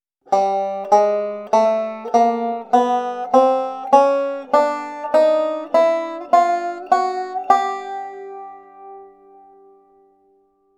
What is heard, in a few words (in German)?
chromatische Skala auf G